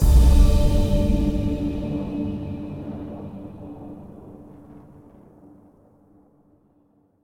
Combo D

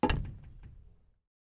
glass land

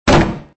wood impact

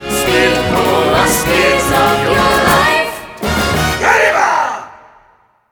Sting; Zombie Zod - Perksacola - Speedcola

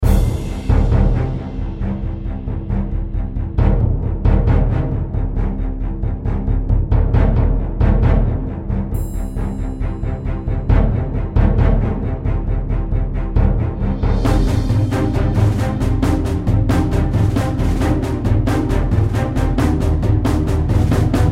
combat music stage 2; Might and Mayhem

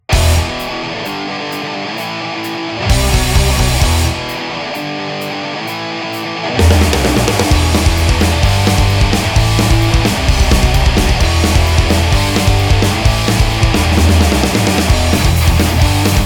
BGM PVP

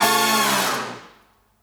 Flashover

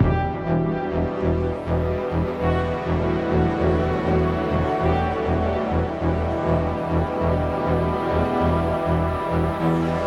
Drawn Loop 100bpm; jenny leclue detectiv

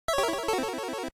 Arcade Lose; WrestleQuest

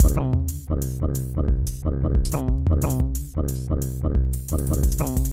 Black Space E - Cartoon

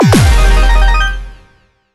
ui new highscore

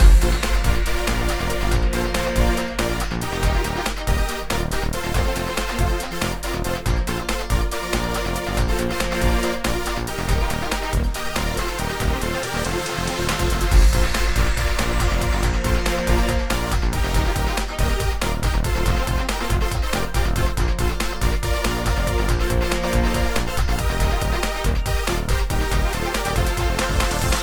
win song; super volley blast

